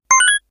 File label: item pickup; coffee crisis